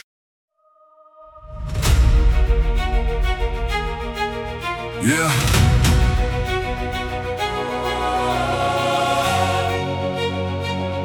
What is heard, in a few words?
rap